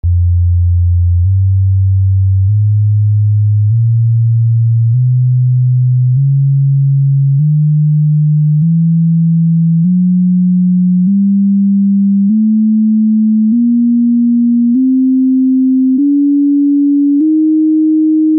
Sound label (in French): Echelle nonoctave tonale 157 cents pour le choeur